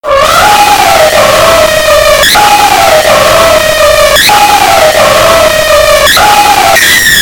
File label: moan; big smoke